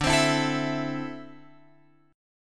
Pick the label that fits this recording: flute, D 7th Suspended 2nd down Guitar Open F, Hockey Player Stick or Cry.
D 7th Suspended 2nd down Guitar Open F